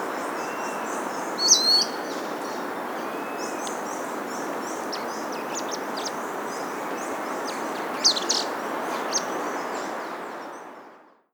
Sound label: forest elaenia; پرنده الینیای جنگلی